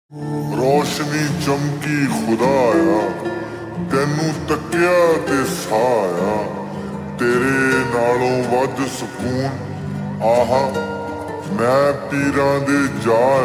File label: Ringtone slowed and reverb